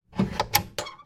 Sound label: household stove woodburner door open